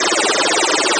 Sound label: Blasters